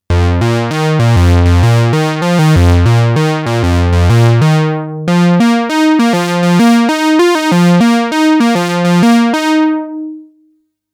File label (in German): Sägezahn Rechteck